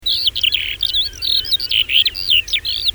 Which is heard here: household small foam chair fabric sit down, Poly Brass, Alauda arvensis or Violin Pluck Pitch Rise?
Alauda arvensis